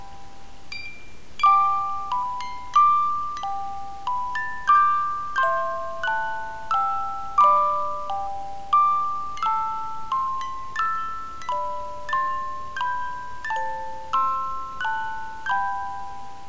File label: Away in a Manger, Music Box